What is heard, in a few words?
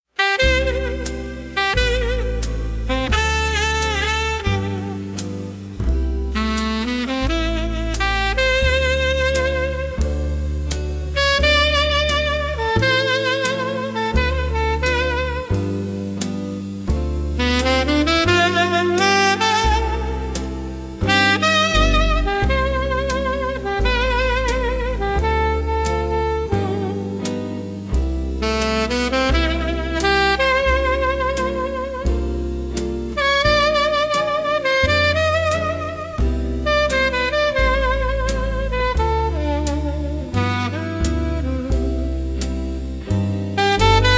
Midnight Horns, smokey night jazz for night bar